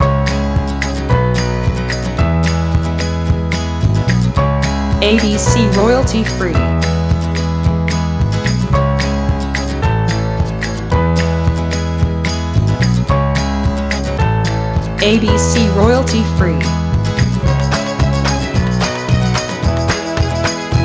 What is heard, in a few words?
Celtic Folk shorter Lo-Fi